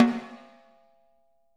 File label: 6X12 WOOD; Living Drums